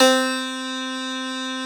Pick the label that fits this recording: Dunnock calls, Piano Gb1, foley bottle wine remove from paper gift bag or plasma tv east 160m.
Piano Gb1